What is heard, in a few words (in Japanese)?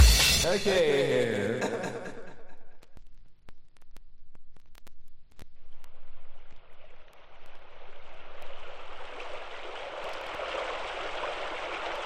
野鳥のファンタジィ, field recording 高尾山収録 自然のうたごえ